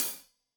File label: Hat Loose, Hi-Hat, Cymbals Stereo